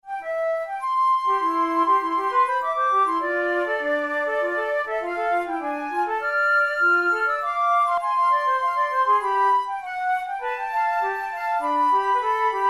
duets, flute; kohler

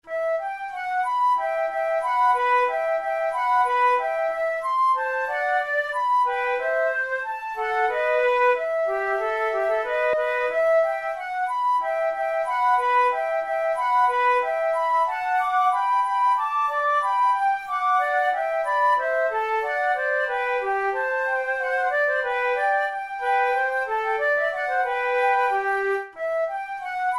andante, flute tunes; loeillet de gant op5 no6 i

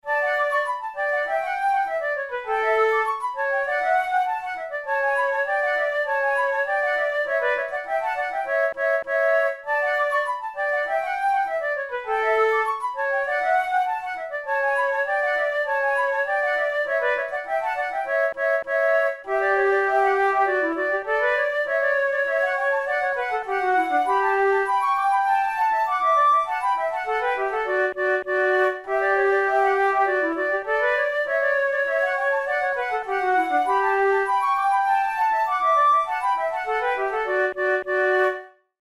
pour la flute duos allegretto; devienne; nouvelle methode

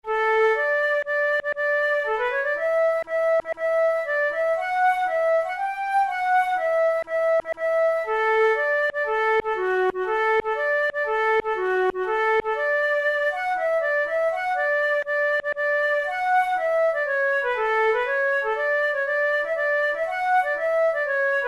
grand march, flute

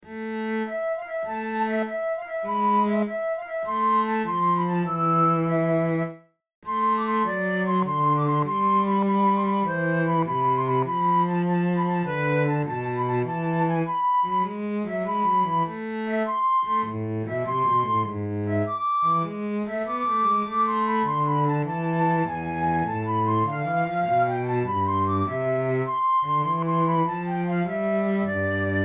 recorder sonata in a minor allegro; marcello op2 no10 iv

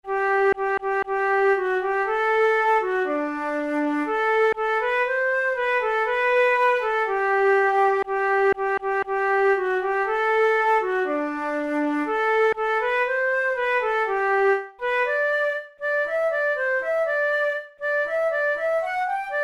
campanas de belen, flute

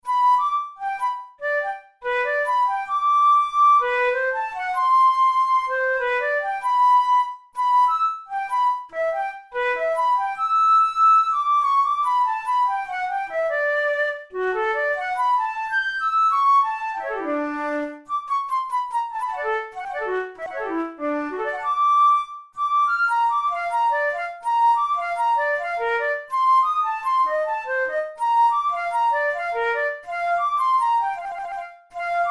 studies for the boehm flute; drouet 72

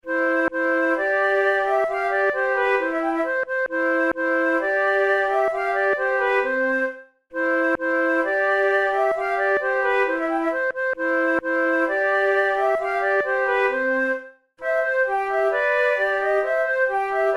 horn duos allegro; mozart

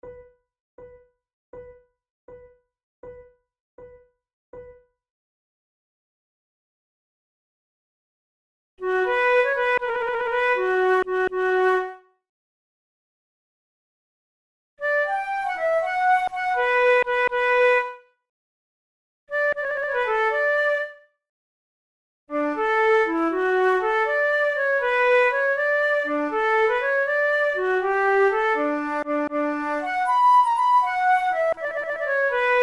two flutes forlana; Monteclair; 6 concerts; flute tunes

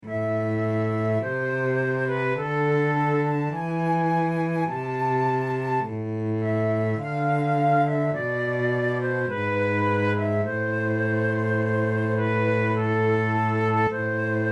recorder sonata in a minor largo; marcello op2 no10 iii

